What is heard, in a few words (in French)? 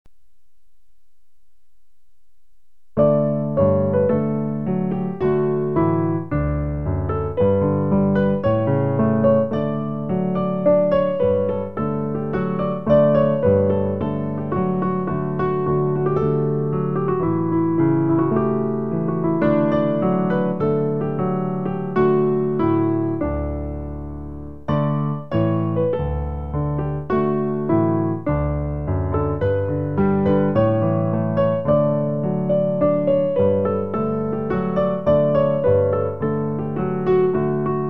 piano; Joie sur la terre; Pour accompagner le recueil Louez Dieu.